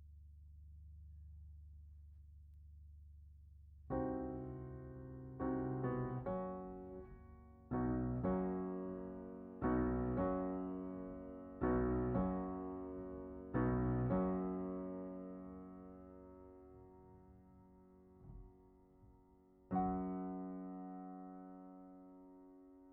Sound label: Piano Mono